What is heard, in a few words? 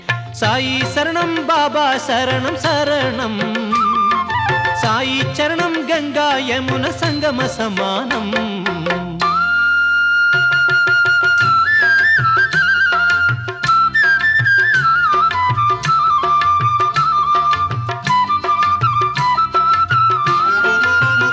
ringtone